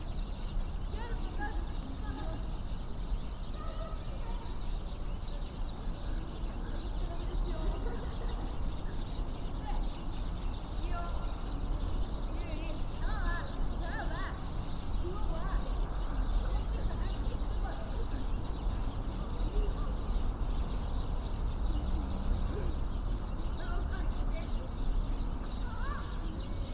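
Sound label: shinhama children play, park, Fukushima Soundscape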